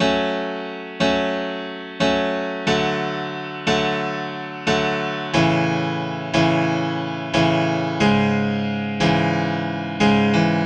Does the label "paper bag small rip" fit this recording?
No